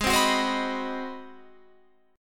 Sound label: G sharp 7th Sharp 9th G sharp, down, Guitar, Open F; G#7#9; strummed